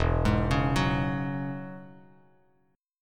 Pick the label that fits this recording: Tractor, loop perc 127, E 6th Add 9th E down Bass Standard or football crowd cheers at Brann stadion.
E 6th Add 9th E down Bass Standard